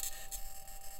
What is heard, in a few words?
mic, mars2020 edlcam